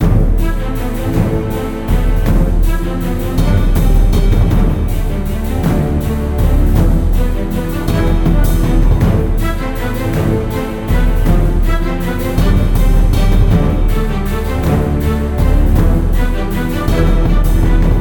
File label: Ruin Outro Music; E.T. Armies